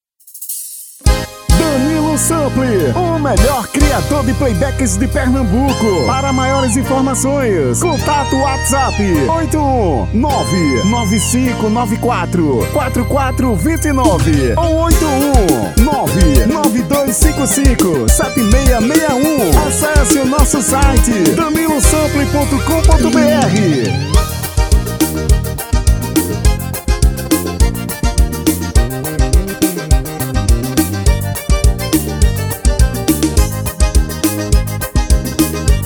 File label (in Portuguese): Forro das antigas